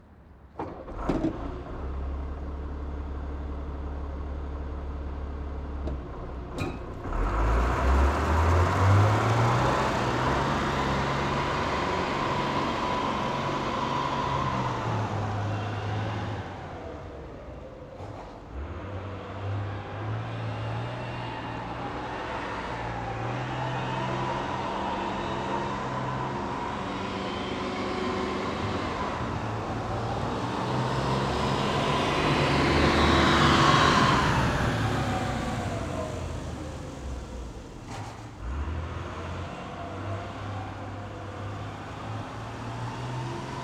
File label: VAB exterior fast startup away passby approach stop shutdown, APC Renault VAB; Pole Position